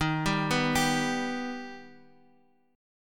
D sharp Augmented D sharp

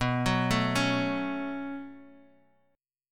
B 7th Suspended 2nd B down Guitar Half Step Down

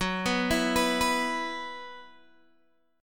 F sharp 7th Suspended 4th, Guitar, Open G

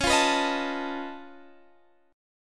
Db Augmented 9th Db down; strummed; JGuitar

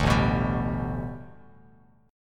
A 9th Suspended 4th Bass Standard, chord; A9sus4; strummed; JGuitar

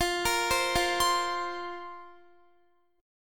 F Suspended 4th F, Guitar; Fsus4; arpeggiated; x,20,20,17,17,19

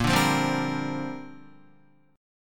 A sharp 6th Add 9th, Guitar

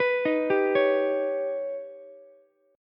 D sharp Augmented 7th D sharp, Ukulele; arpeggiated; JGuitar

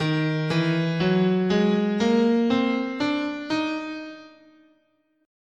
D sharp Neapolitan Major, guitar, scale

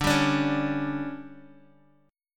D Major 7th Suspended 4th, Guitar, DADGAD

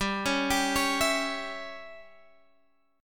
C sharp Diminished G

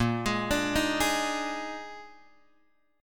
A sharp 11th; A#11 Chord {6 X 6 7 4 4} arpeggiated

